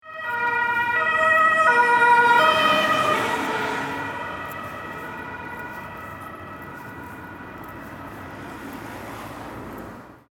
Polizei

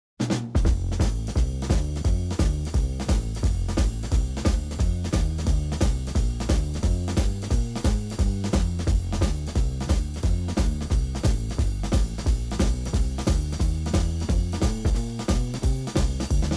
Drums Percussion Boogiebass Mit Schlagzeug

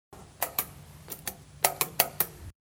Code am Tuerschloss eintippe

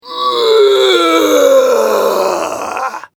vox schrei m

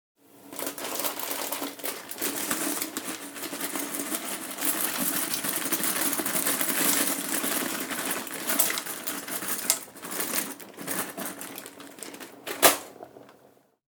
Nudeln in einen Topf geben

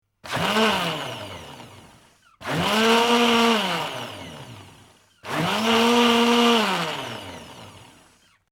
Mixer Intervall

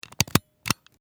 office locher

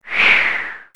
windboee

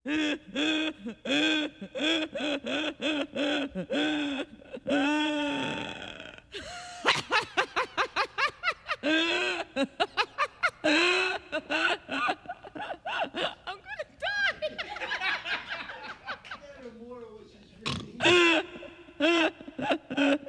Lachen Lachende Dame

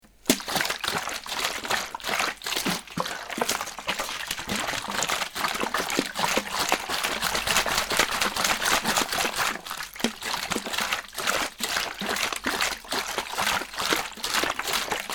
Flasche schuetteln

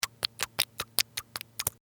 schmatzen

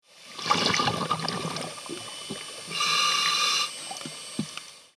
Unter Wasser Atemluft

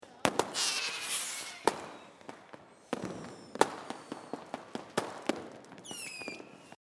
Silvester Atmosphaere

